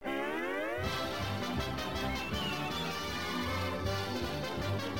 classic theme; looney-tunes

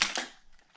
LMG Remove Mag

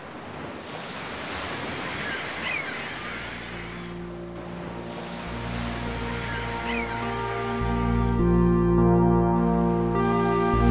Title Track

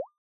dropdown open

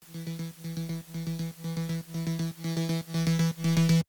4 Sec Tempo

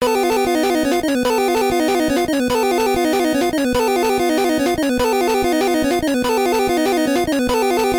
boss theme; simpsons

